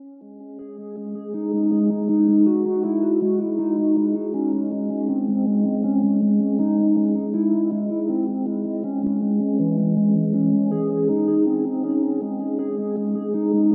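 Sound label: Drum loop 44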